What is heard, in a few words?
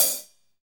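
HH, hi-hat; 90 s Sample CDs; Sound & Vision - Gigapack I CD 1 (Roland); Real-Kit m15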